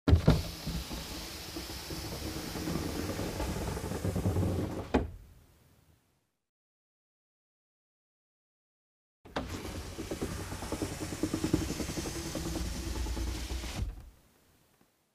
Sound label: Car glass lowered, raised manually; windows